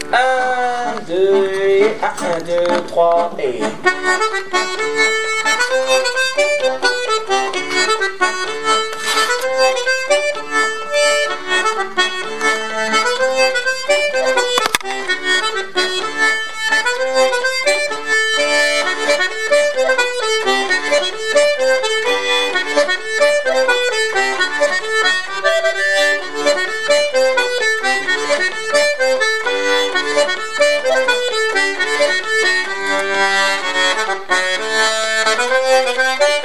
Bourrée, tempo normal; Avant de s en aller

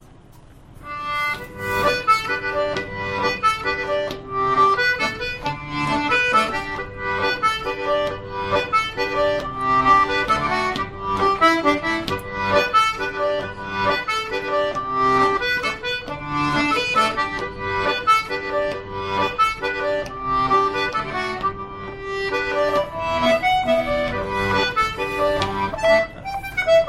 Mazurka irlandaise Inisheer

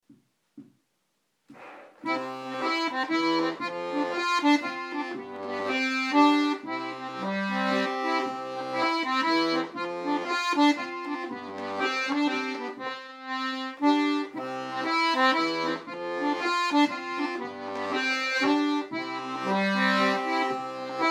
Mazurka du printemps; rencontres-2011

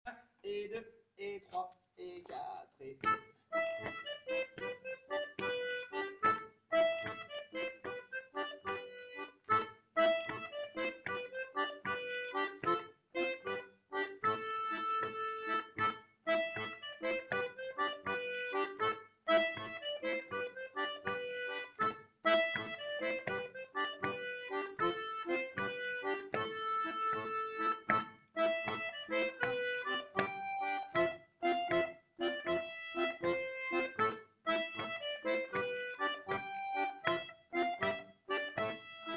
Entre deux voix2 flûtes; Musique d-ensemble

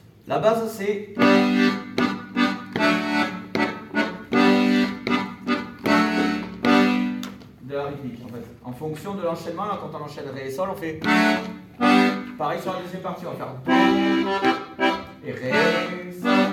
Rythmique diato; Rondeaux à Léa; Musique d-ensemble

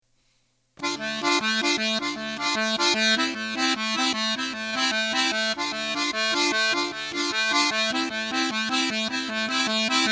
accords

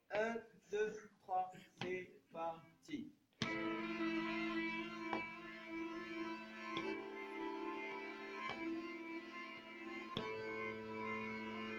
Valse plan violons sur MI Fa Mi; La cancon

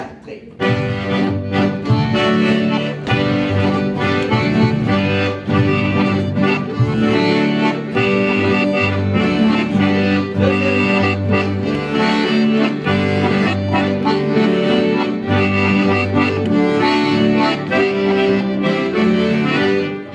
Accompt piano; Rondeaux à Léa; Musique d-ensemble

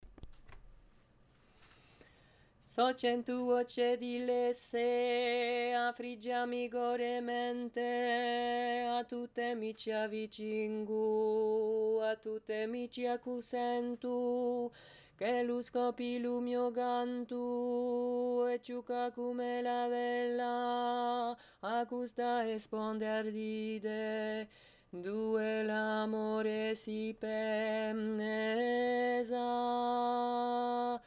voix

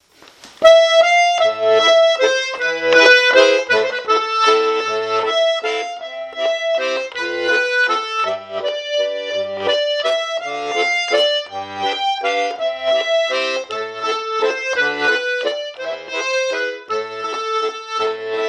valse; Pianta verdolina; Rondeau 2023

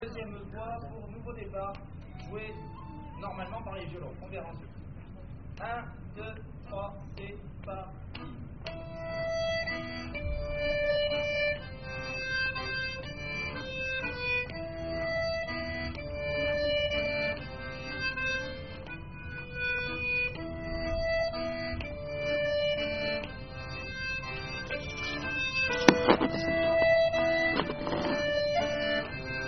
2eme voix violons; Mazurka Nouveau depart

